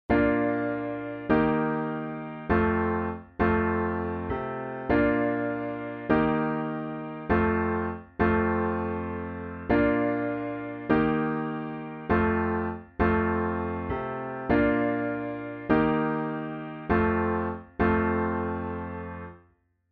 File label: rock piano chords